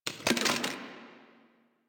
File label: Weapon Liberty Launcher Reload; Warframe; Caliber Chicks Minigame; Weapons